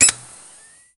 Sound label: soft camera flash sound w